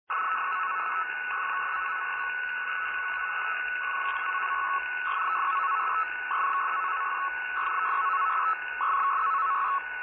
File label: Pactor